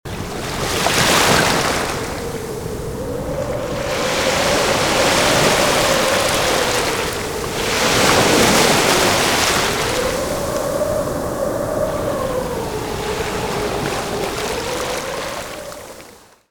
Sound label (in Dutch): Golven Zee